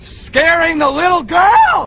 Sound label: scaring